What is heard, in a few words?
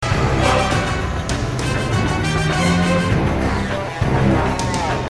Game Tune